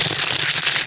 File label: Rip Tear Flesh